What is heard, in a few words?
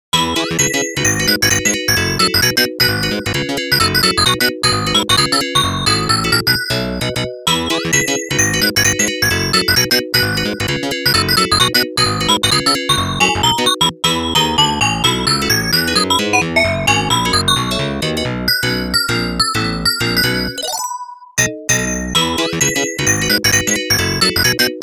normal hurry up